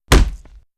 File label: Hitting wooden door with fist